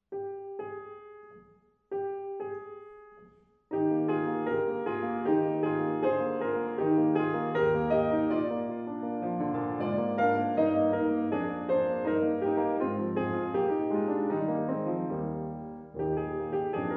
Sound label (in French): variations puériles pour piano à quatre mains; sur une mélodie de carl reinecke